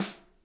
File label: SNARE, Interactive Drum Kits; Sonic Reality